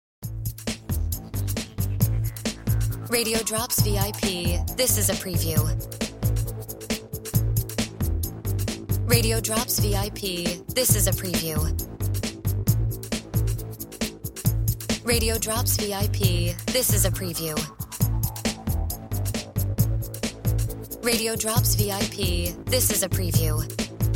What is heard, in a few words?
No FX Music Bed